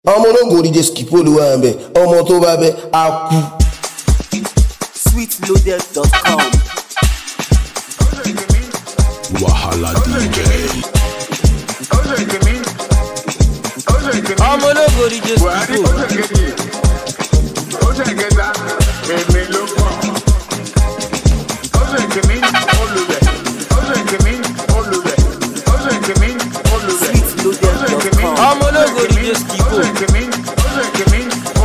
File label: Amapiano Refix